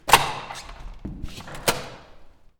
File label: Pushing Door Open